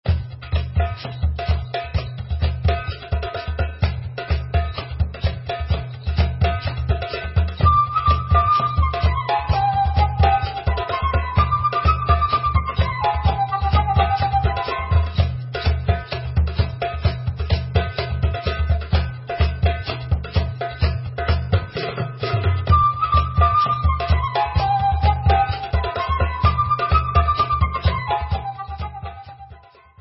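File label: Instrumental, Israeli Dances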